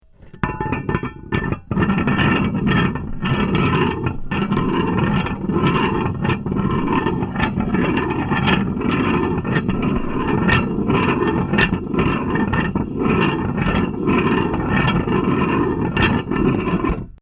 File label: Metal Chain Rubbing Long; Chain sounds; Metal Sound Effects; Lynne Music